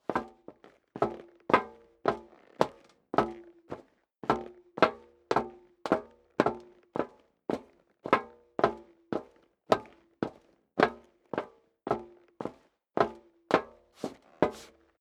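step dress boots metal dry upstairs sequence, footsteps; schritte; menschen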